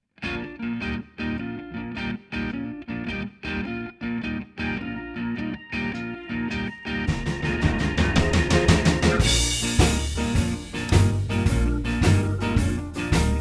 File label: basic blues exercise tracks in Bb